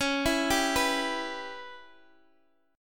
Db Minor 7th Flat 5th Db down Guitar Open G

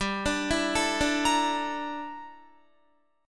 G Minor 6th Add 9th G down Guitar; Gm6add9; arpeggiated

